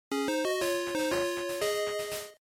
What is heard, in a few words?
GB Action Clear